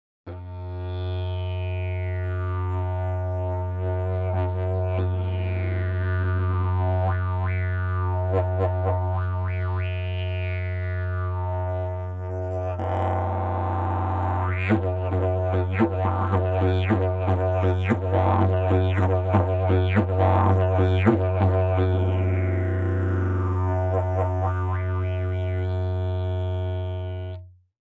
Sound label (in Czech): jilm jesterka, didgeridoo